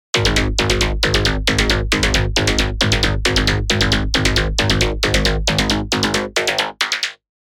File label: Rolling Loud, rolling bass; Revealed Serum 2 Rolling Bass Vol. 2